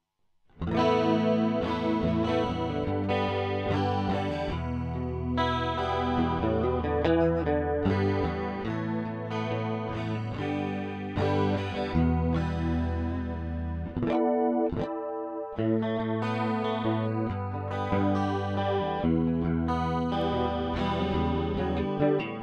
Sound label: mono chorus; magix; packplug